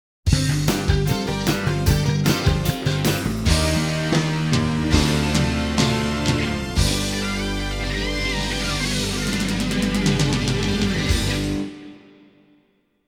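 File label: Fanfare - Instrumental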